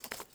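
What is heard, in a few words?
ui pickup charcoal; Rust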